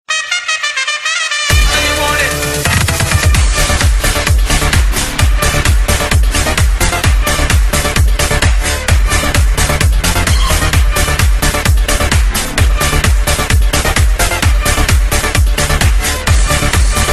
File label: Electro